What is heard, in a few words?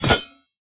chop steel tube